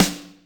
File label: snare drum D key, snare sample tuned to the D note. Loudest frequency: 2754Hz; snare drum samples